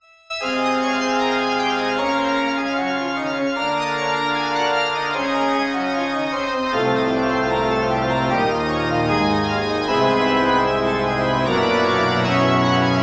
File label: Organ